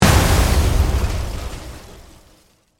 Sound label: Hit Crash Heavy, Glass, Debris, Impact; Production Element, Imaging Element, Accent, Transition